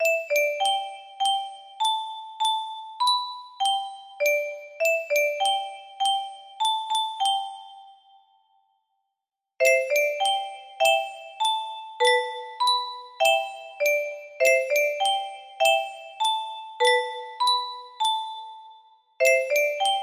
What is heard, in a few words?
muro box; clannad